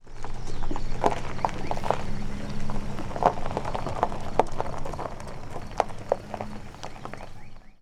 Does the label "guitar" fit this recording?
No